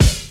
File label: BD, Dance Drums